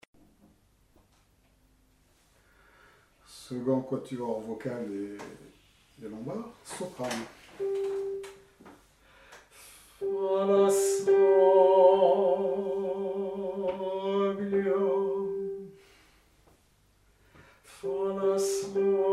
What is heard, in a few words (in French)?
lombard soprani